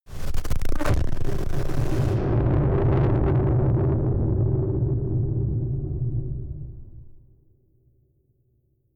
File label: distorted explosions